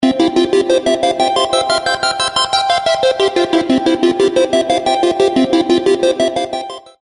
Hi Tech Alert Ringtone

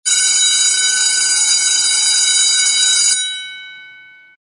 bell school outdoor ring

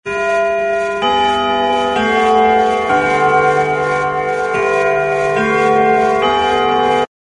grandfather clock half hour chime

